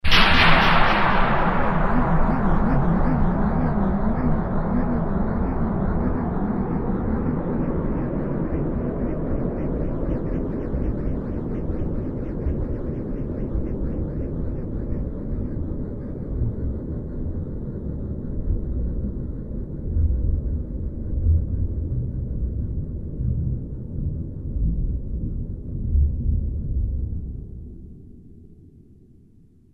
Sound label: hell